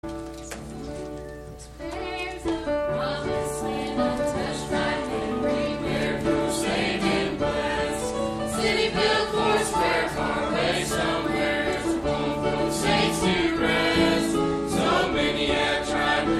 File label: Youth Choir; Sing About Heaven For A Million Years